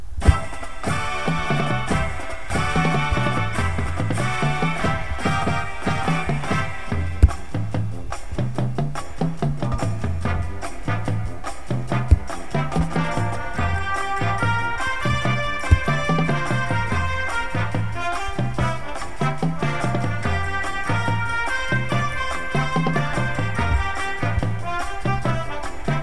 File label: bass with music, drumline